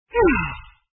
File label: sigh; grade5